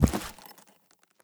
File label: step, footsteps; freak fortress 2; sniper ops; nighteye